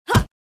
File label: Martial arts punch and grunt female